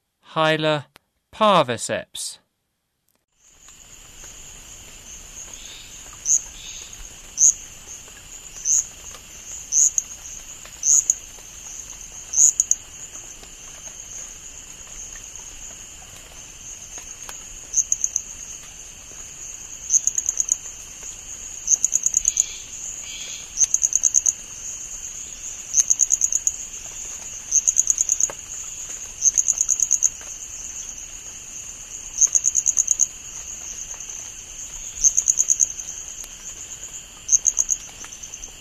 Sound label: Hyla parviceps, Frogs of Ecuadorian Amazon; S. America